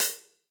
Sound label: Hihat Closed Warm And Low; Drum Hits; Ableton; Factory Packs; Session Drums Club; MPE Hits